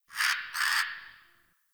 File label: Tree Critters Angry; Ambience; Props